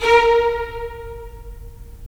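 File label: strings violins, right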